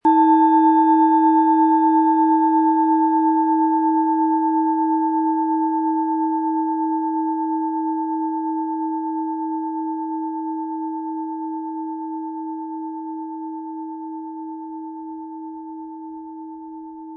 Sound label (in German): Planetenschale